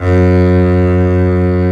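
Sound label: Bass legato F#1; Digital Sound Factory Virtuoso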